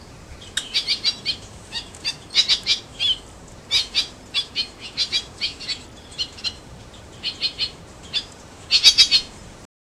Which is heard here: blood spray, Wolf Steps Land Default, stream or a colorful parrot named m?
a colorful parrot named m